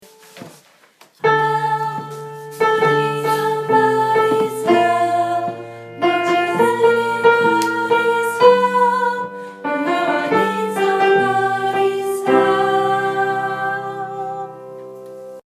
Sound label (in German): Sopran